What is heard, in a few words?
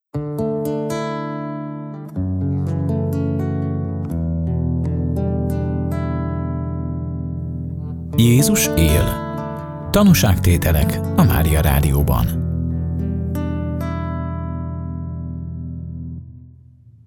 Jingle; Jezus el (Tanusagtetelek); Tanusagtetelek Szentgyorgyrol